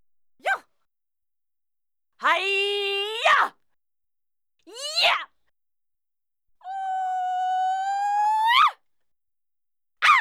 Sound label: Yelling Female Karate Action